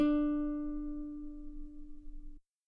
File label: guitar D4 very long forte normal; bananenkeyboard